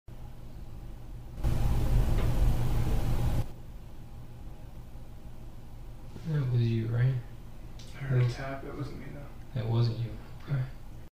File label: tap heard tap